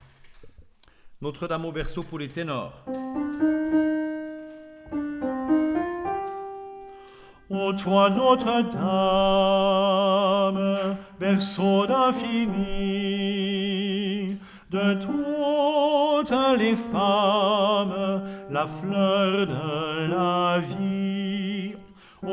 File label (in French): Chants Notre Dame au berceau T